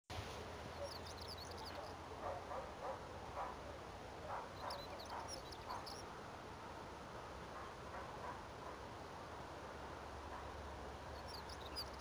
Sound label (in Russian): chekan